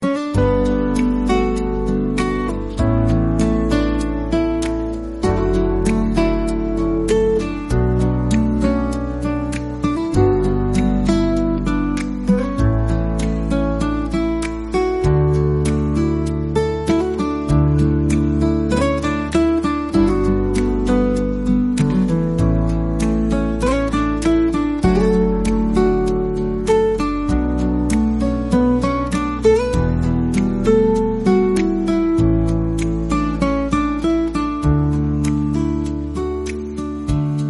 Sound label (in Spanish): Musica acustica